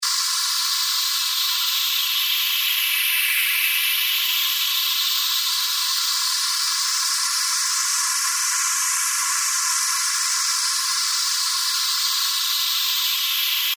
Noise FX Loop 140 BPM Mist; revealed fx loops vol 2

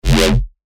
Bass Shots F; Wicked; revealed bass shots vol 4

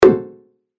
percussion; Reliever; revealed percussion vol 2

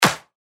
Clap; 1stM8; revealed claps snares vol 2

